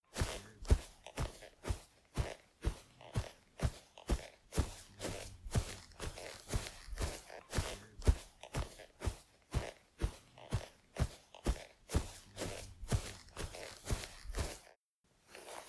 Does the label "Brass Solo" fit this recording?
No